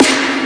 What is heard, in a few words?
melbourne bounce snare big; Hip Hop; STYLE KITS; D3ron Melbourne Bounce Kit